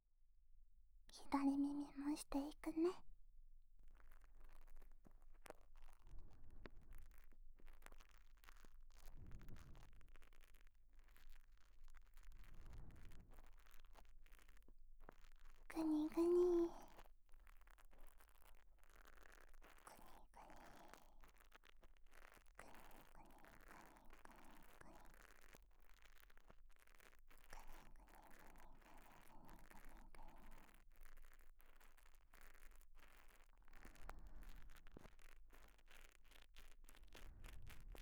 シリコン耳かき L

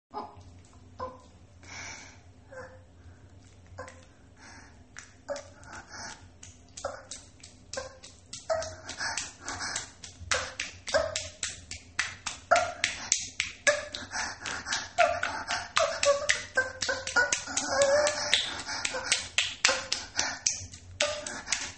【オホ声】お風呂場オナニー盗聴録音

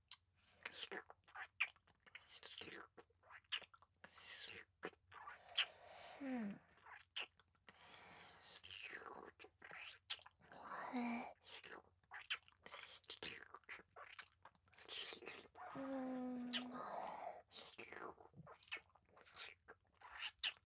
乳首舐め, ASMR; loop音声

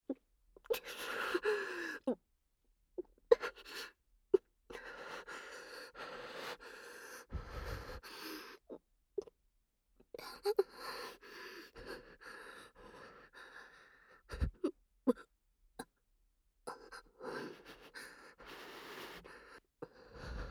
喘ぎ, ASMR

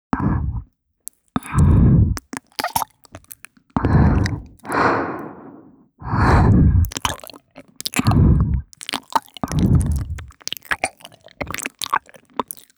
イジワル耳舐め; 渚

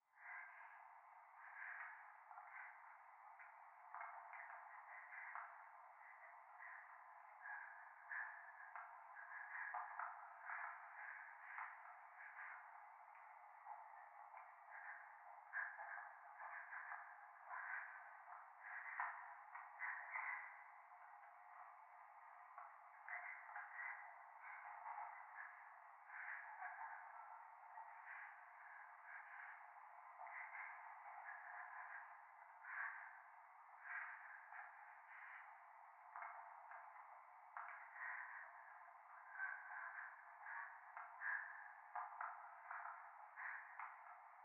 教会 右耳快感吐息 電車

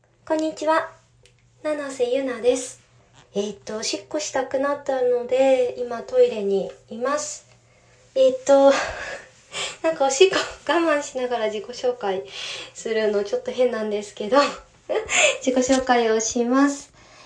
立ったままボウルにおしっこ

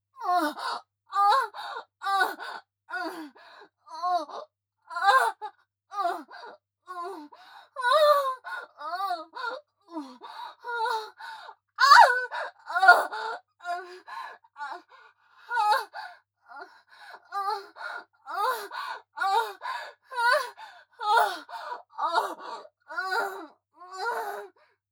正常位・喘ぎ・通常; ヘレナ

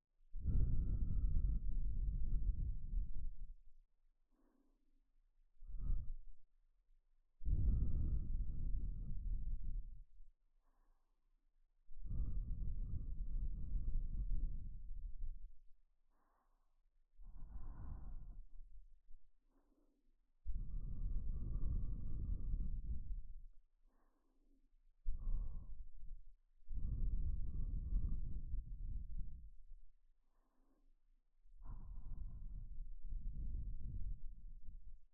左耳ふー

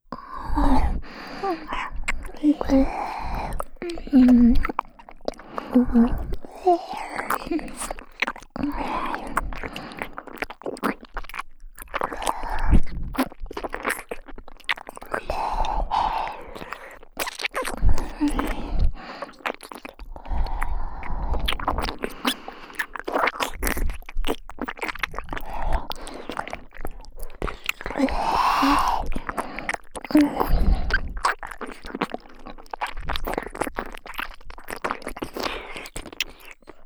壁尻パート2 W耳舐めループトラック, ASMR

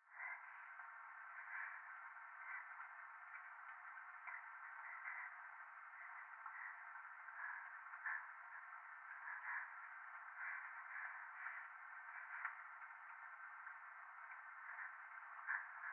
トイレ 右耳 快感 吐息 雨

